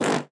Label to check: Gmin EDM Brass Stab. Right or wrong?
Right